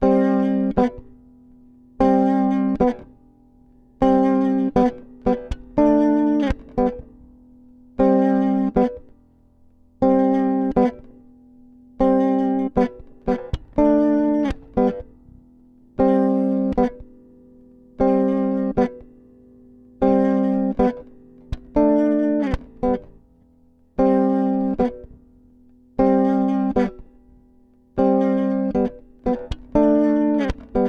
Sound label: jam chords